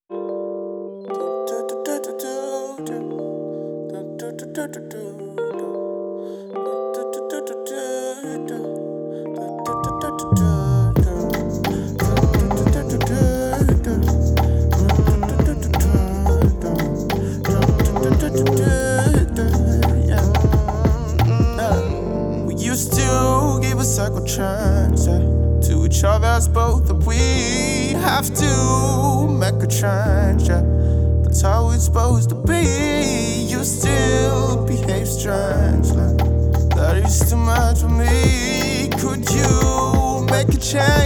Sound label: Brass; Nord session